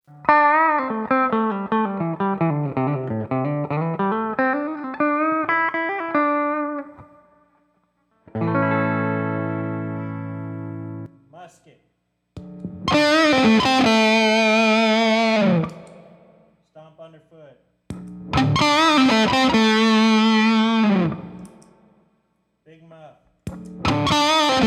Russian Muff lead; Musket; V8 Russian Muff

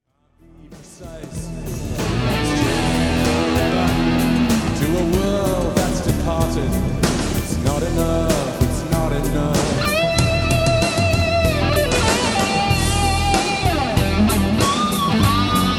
First Solo EMG Strat Muff CE2

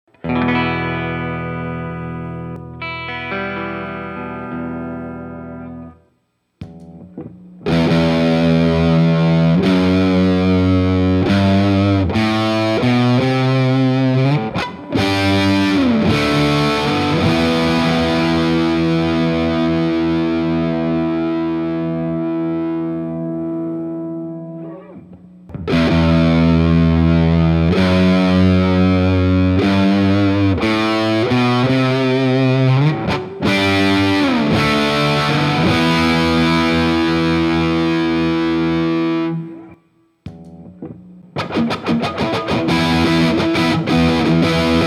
Muff VS Stomp

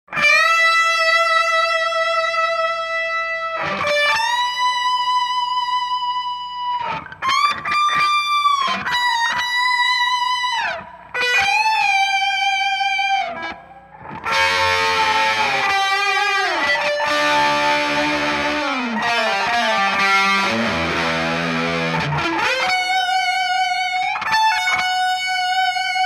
Solo double track style delay

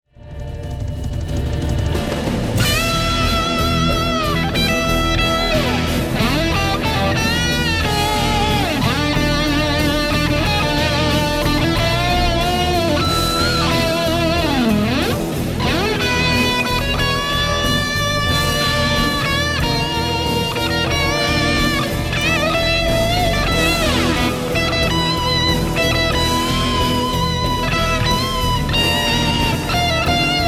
Take A Breath Solo, Boss BD2

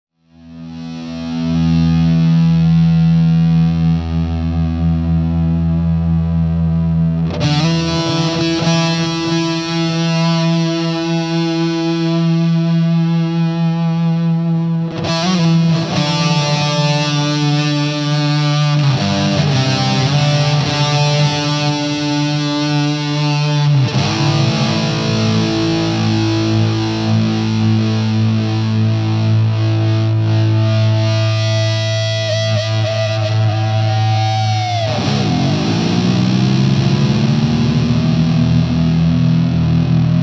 Intro Compulator and EHX Green Russian Bassman; Rvs Eclipse

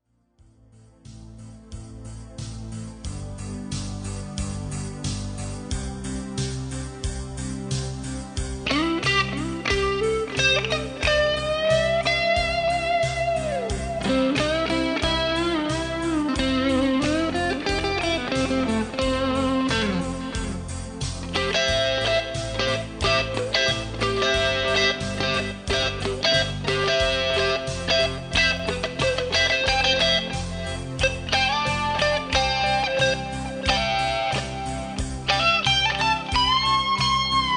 Solo

